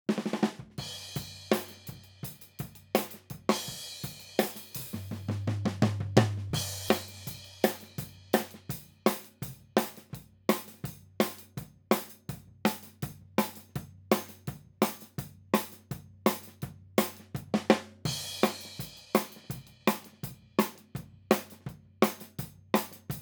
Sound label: Ride Over; BMW Blues EWU